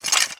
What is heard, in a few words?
metal